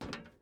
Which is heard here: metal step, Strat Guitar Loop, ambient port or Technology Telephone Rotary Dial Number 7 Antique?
metal step